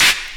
CLAP, Dance Drums

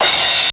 RZ1 CRASH; Crypton - DrumBase5000

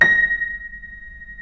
A#5 L, Keyboards Acoustic; USB Soundscan; AKAI

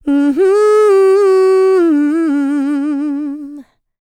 LICKS, Vocal Planet, R&B; ILIO; Dance; LT R+B

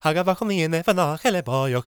GAELIC, World Voices; ILIO; Vocal Planet

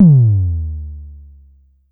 Drum X Tom, Pearl

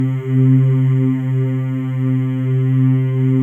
male; Spectrasonics; Symphony of Voices

